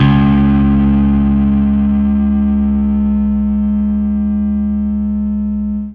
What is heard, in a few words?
Fuzz C sharp 2; torchlight iii; ps4